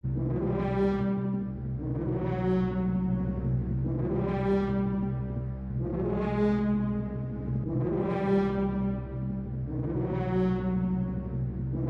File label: Triple Brass; Destroy All Humans